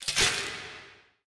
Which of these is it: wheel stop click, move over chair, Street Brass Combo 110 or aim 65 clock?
wheel stop click